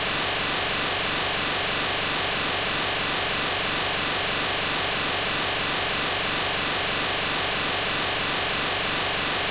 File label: Bad Windows Dithering Noise